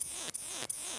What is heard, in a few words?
song convexa, katydids neduba